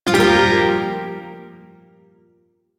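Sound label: Shawzin Minor Chord; DE; Warframe; Duviri Shawzin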